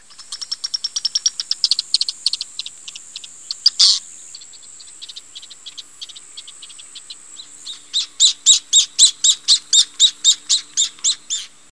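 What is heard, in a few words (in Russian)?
amerikanskaya yakana jacana spinosa, northern jacana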